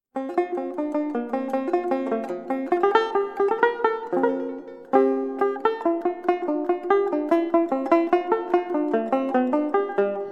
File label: bach cello, banjo